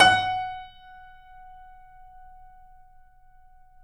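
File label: piano, F#, left; InVision Interactive; The Piano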